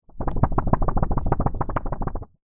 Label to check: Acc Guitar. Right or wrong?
Wrong